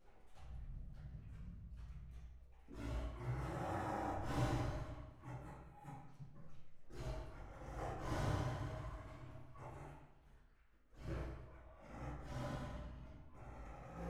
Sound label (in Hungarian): etetés vacsoráját védő, bengáli tigris